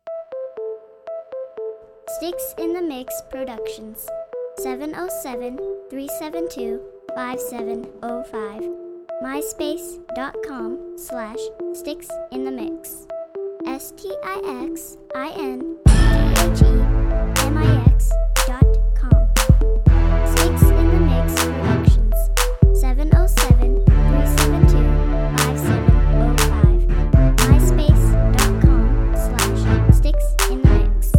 funky

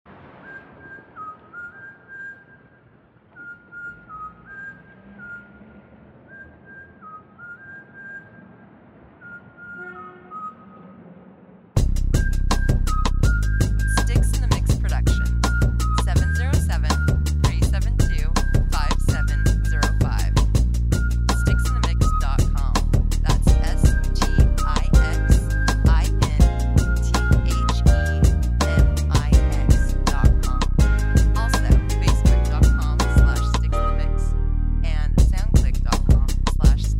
Whistle in the City

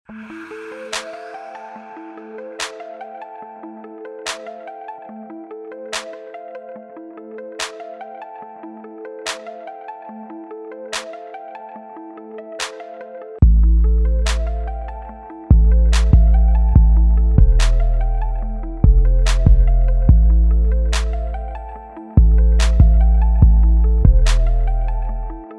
Cold Breath

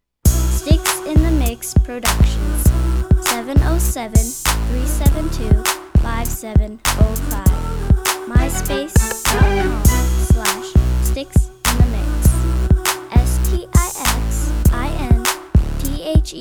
uptempo uh huh huh, beats

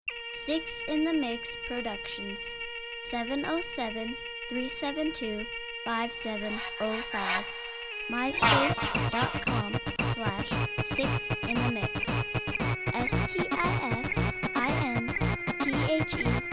uptempo dance

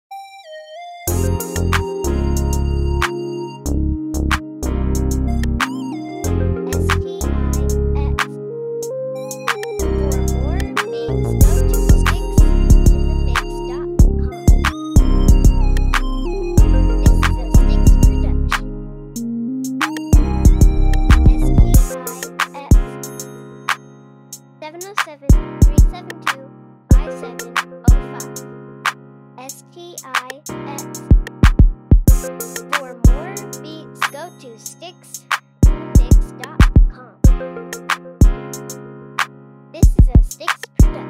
Keys

